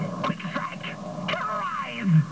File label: quick strike